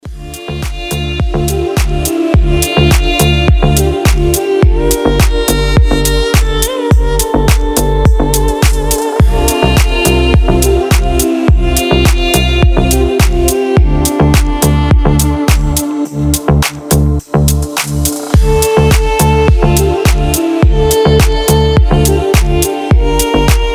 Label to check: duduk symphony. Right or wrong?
Right